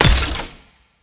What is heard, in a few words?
pen arrow